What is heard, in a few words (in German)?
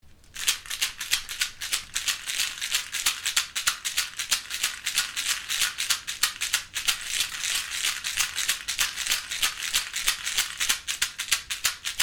rassel, schamanische rasseln